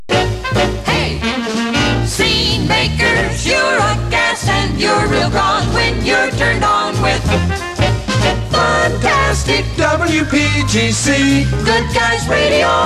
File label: jingles; 1966; spot productions; funtastic youre a gas